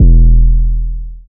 808, E; Drums; One Shots; Humble Hip Hop Sample Pack

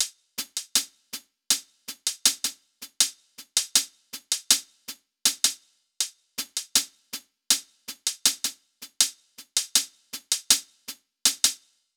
Hihat Loop - 80 BPM; Trend; Cymatics; ANALOG EVOLUTION Beta; Hihat Loops

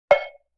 Cowbell Mid Damp; Vintage Cartoon; Drums & Percussion

